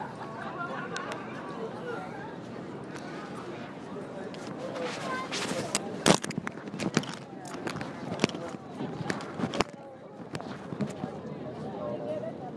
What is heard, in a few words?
ambiance for flowermart